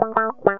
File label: GTR, Funky; Zero-G; Funky Elements